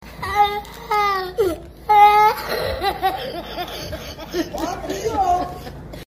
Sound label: African Boy Crying Then Laughing